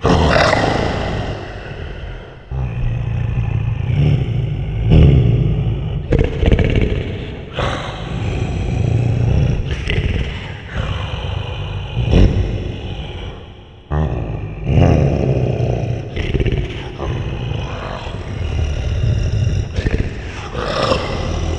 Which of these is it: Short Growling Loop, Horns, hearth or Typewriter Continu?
Short Growling Loop